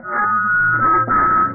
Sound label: crane; bump n burn